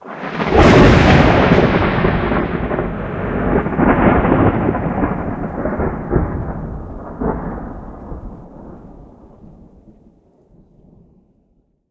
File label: thunder clap rumble; stereo; Phasmophobia